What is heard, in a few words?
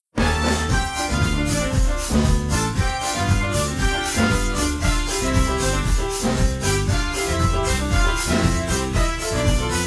Jazz; speed 0.83; tsmtoolbox; phasevocoder